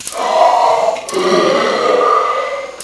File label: tank idle; quake2; coop